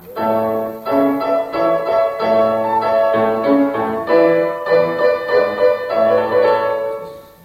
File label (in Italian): menuetto